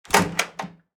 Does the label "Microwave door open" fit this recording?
Yes